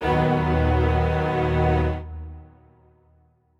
strings

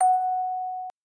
orgel

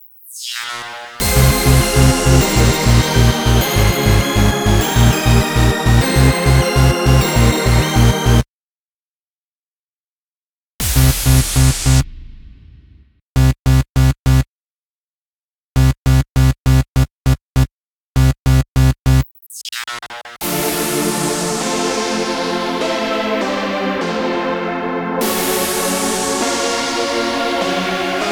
bgm

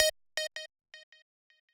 lead

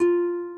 harp

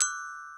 gloken

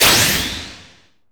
SE GAME BREAK; maimai

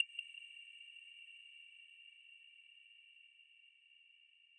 arp

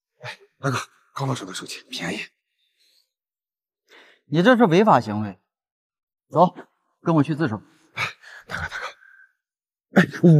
Vocals; 原版绳之以法变身视频来了; 昊京

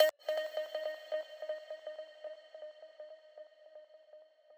arp

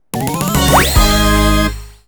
JINGLE CONTINUE; maimai

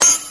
Kick; 锤子

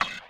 breakbeat

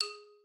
xylo

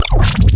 custom bass

